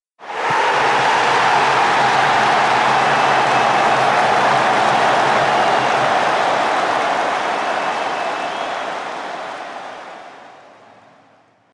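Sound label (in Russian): реакция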